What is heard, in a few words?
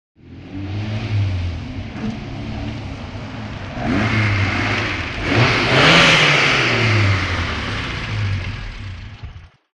Stop Off Alfa Romeo